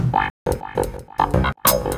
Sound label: CLAVINET; Ragga & Dub Reggae